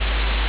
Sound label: ambience room tone